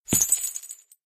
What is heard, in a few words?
footstep; chriswalker; slender